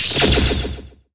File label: Games Ringtone